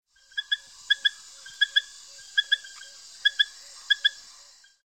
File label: Allobates Femoralis, Dart Frog; FEMORALIS (BRILLIANT THIGHED FROG)